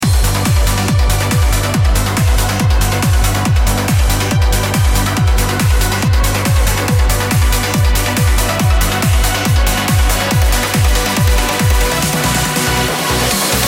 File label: Uplifting Transition, No-Talking DJ Mix; Uplifting Only 670